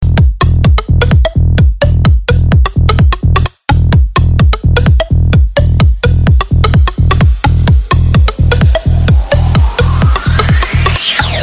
Elegant Mix Ringtone

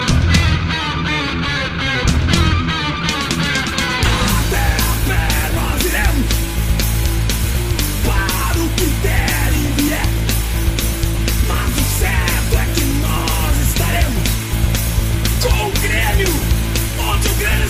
Gremio Rock

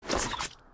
Auto Attack; Xayah

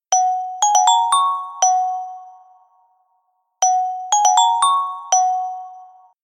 Shimmer

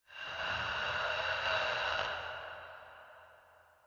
Wendigo sound

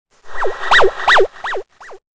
Robot Sms

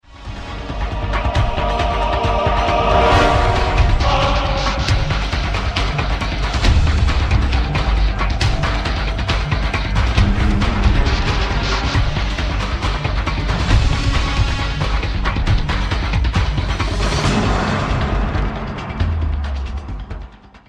Alert Mode; Mgs 4

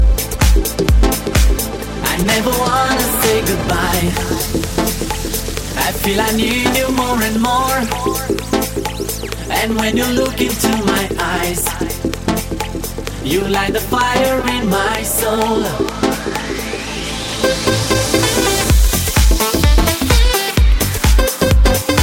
House Club Hit

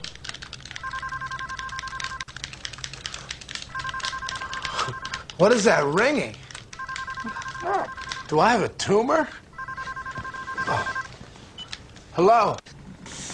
Ringing

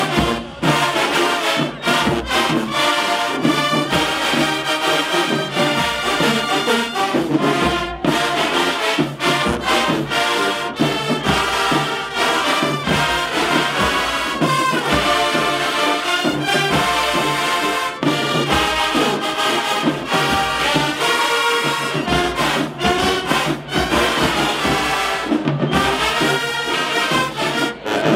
Jazz

